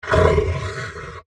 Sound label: Monster Vocal, Large, Creature; Horror, Monster, Vocal, Element; Monsters